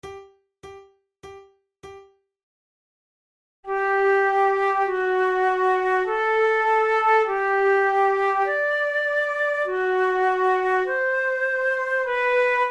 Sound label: duets, flute; forty progressive duets